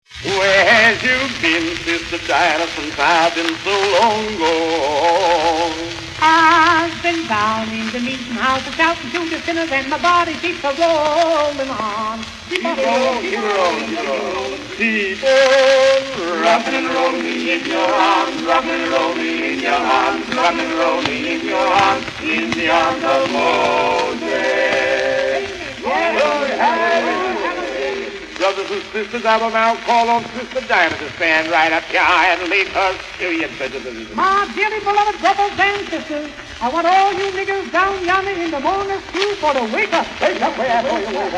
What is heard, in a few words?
Male Quartette